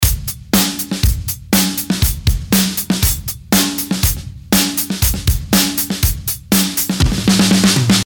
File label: drums